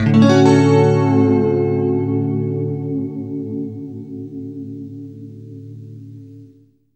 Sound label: FLESH CHORDS, Guitar